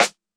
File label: swing snare; Hiphop